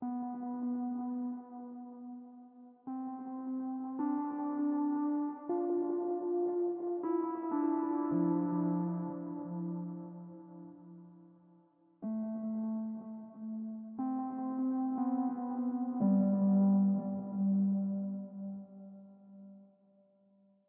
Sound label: Stingers Slow